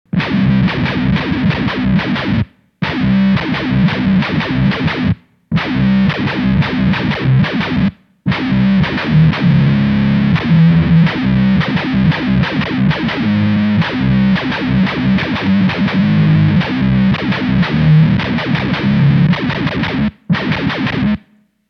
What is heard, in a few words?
The Micro Syntesizer Unbelievably heavy envelope synth; Monte Pittman